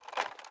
movement, g36; ARC9 uplp fesiug

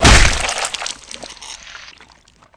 headshot, violence; Benny Placeholders

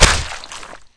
body splat, violence; Benny Placeholders

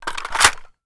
mag in, weapons m16; arccw ud

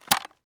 grab, m60; weapons; Urban-Coalition; Anarchy; arccw ue

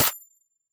mech last, weapons, mini14; arccw ud

